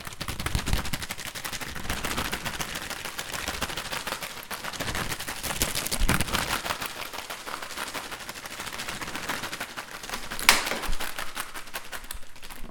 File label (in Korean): 다다닥